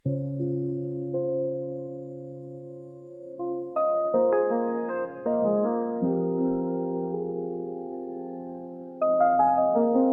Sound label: focus lofi